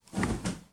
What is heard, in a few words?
Cloth Flap